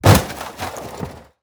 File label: plastic break